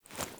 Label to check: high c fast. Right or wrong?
Wrong